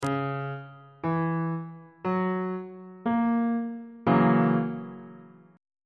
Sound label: g flat dominant 7th 2nd inversion on bass clef